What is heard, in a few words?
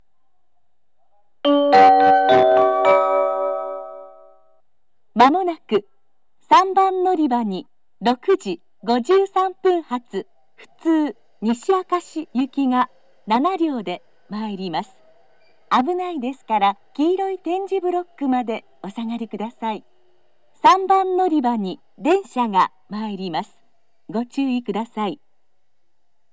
local, station; nishi-akashi